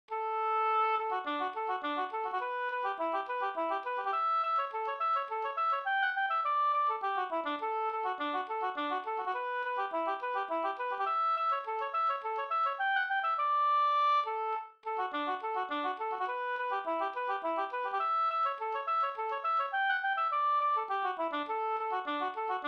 polska Rapp-polska

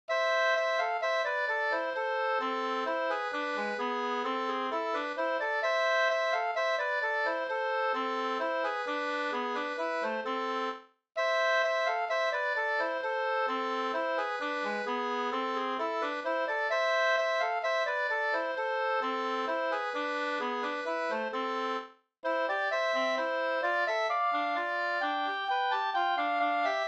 hambopolska amoll, 2st

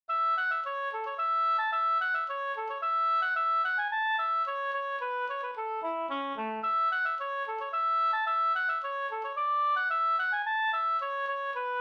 slangpolska

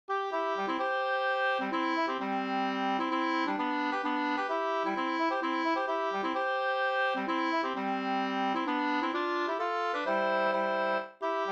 hambopolkett; Vastmanlandspolketten e Axel Axelsson Sd 2st V1

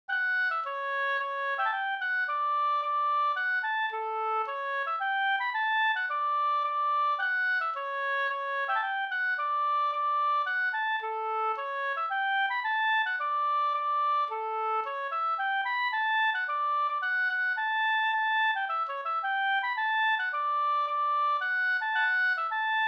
Majstangspolska; AP Olsson Visnum Vr Leffler

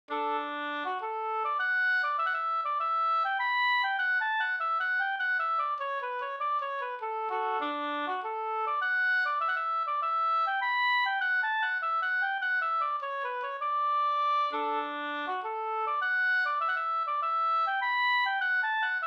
polska

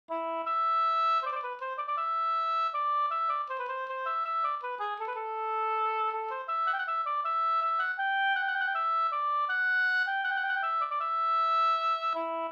ganglat; Tingsmarsch fr Leksand

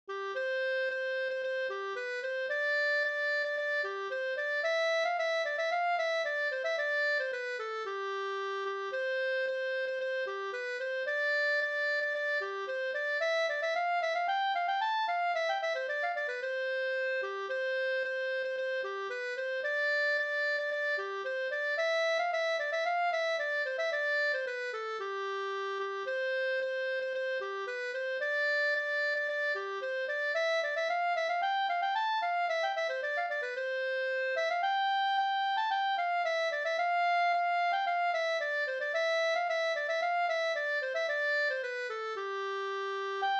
ganglat; Mungalaten fr Up

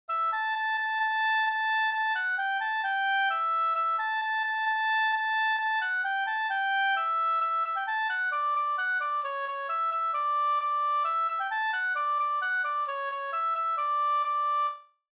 folkdans Ddur; Lintunen

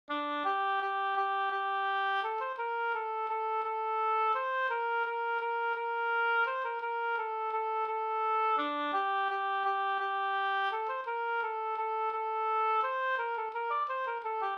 Begravningsvals

